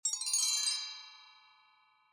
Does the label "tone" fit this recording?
Yes